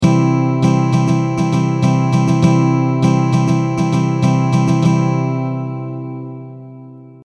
stroke d, guitar

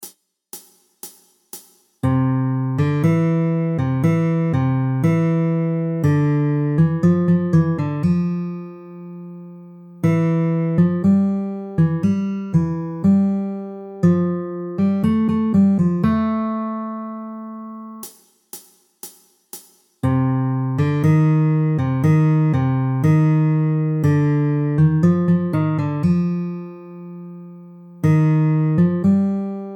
scale, guitar

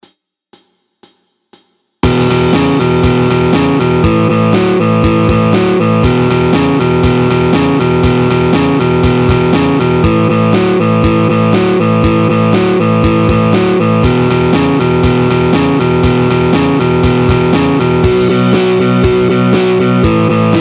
rock sound; teiban

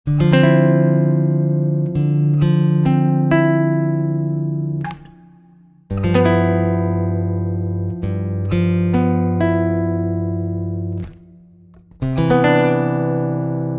bossa, guitar

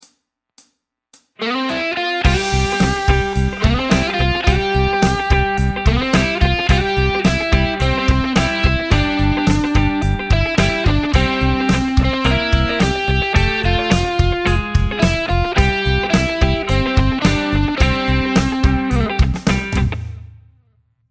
backing cutting

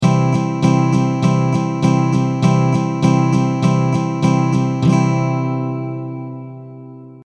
stroke e, guitar

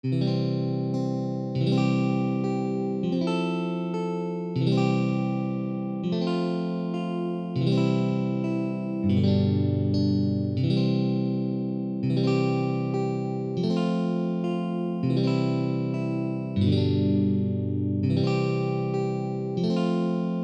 kirakira hoshi simple, guitar

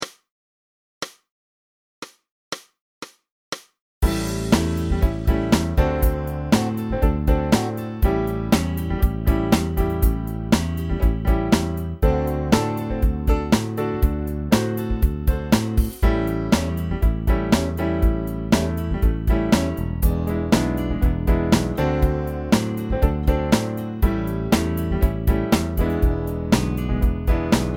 Blues A, guitar; GarageBand

